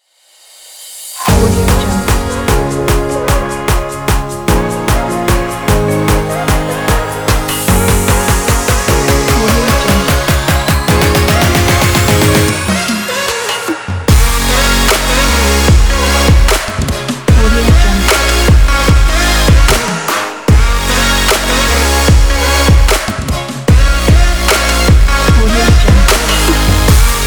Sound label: future bass